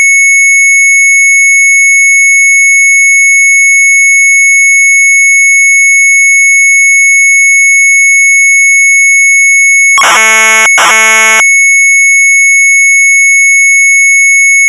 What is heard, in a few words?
Sharp MZ800 Tape; SPOELEN TRAFO; retromelsarchive